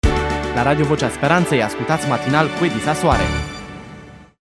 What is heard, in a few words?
Jingle Matinal